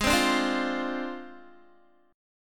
Ab 6th Flat 5th down Guitar; strummed

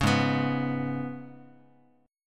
Ab Minor Double Flat 5th down Guitar; Abmbb5; strummed

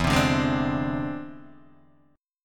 E Augmented 7th E down Guitar DADGAD

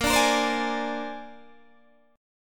Bb 9th Bb down Guitar DADGAD

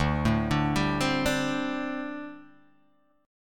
D 7th Suspended 4th, down, Guitar, Open G

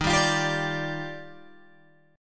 D sharp Major 9th, Guitar Open G

